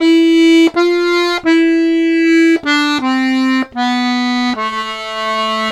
Accordions; USB Soundscan vol.40; AKAI

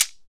SHEKE, Afro Shakers, Shekeres; Roland LCDP14 Africa VOL-2

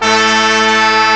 HORN; BRASS1

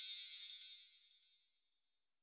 Bar Chimes; Roland BK-5; Percussion

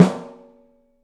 OPEN STEEL SN; 3D Drums

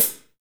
HH hh; Best Service Gigapack 2

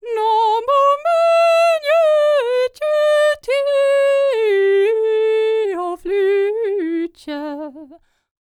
SCANDINAVI, World Voices

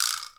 VIBRASLAP; PlatinumPhattCD2; E-MU Producer Series Vol. 8 – Platinum Phatt; Flexis Etc

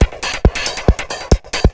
138, Techno, drum loops

